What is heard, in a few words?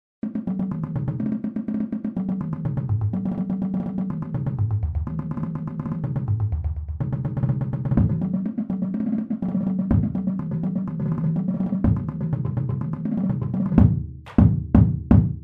124 bpm